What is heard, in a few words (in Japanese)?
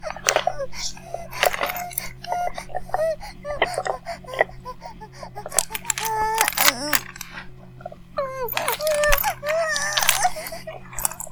バイブ放置 半イキ